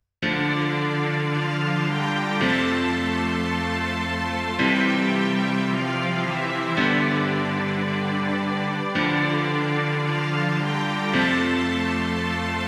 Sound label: Karaoke